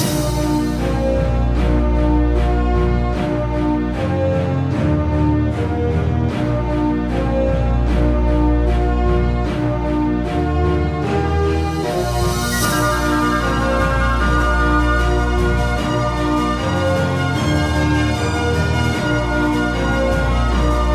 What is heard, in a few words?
String Examples; Exhibit C